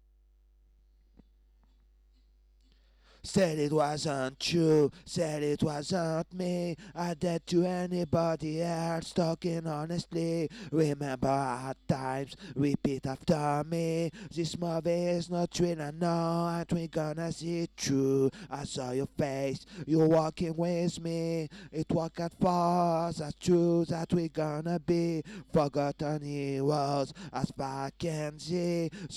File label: vocals part 1 full not overdriven; REMIX KIT